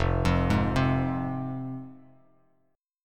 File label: F Minor F down Bass Standard; Fm arpeggiated